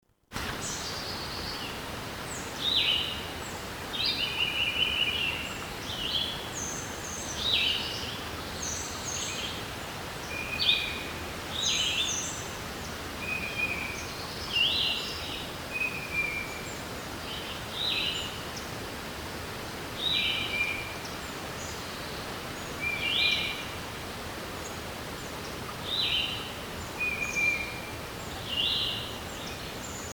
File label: Yellow-throated Vireo, Dorchester Co MD